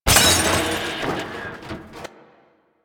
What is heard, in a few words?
Destroy Television; Warframe; Ambience; Nineteen Ninety Nine